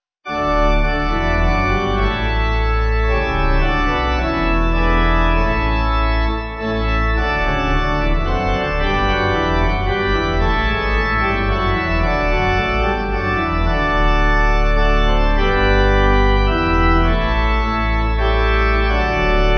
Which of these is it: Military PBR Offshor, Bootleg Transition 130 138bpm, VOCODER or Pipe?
Pipe